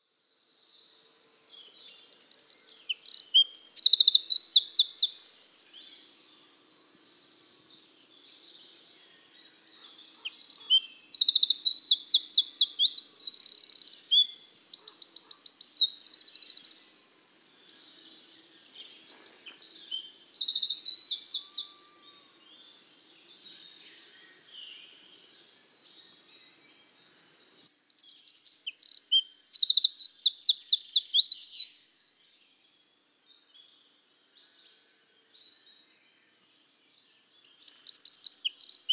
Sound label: wren troglodytes troglodytes